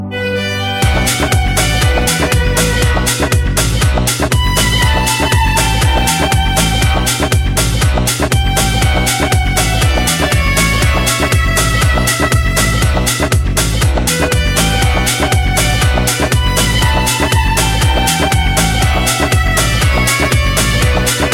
Grooving Tone

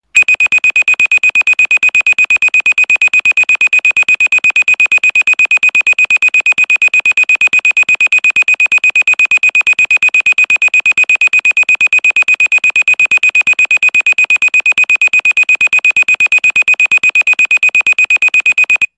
Pager Alert Tone

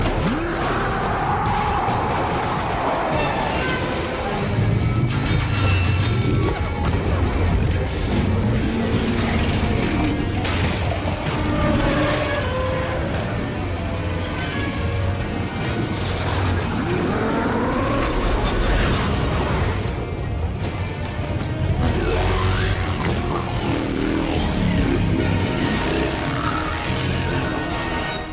Bike; Ghost Rider

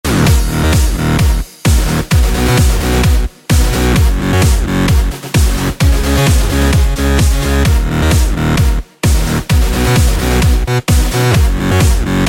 electronic

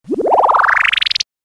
Wobble And Whizz

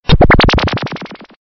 Planet Vibration

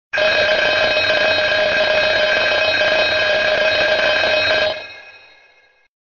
Vintage Alarm

